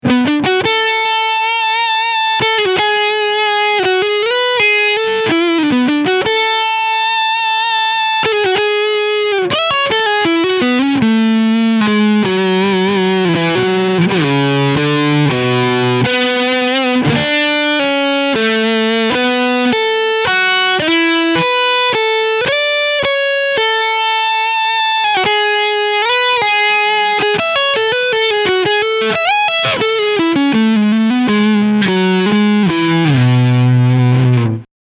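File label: PRS Overdrive Middle Pickup, guitar; Studio Wood Library CobaltBlue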